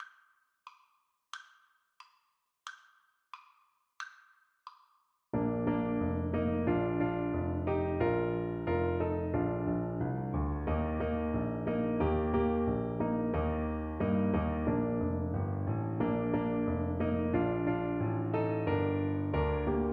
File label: do lord, Tuba